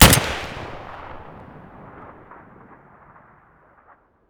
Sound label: svd muzzle stereo, weapons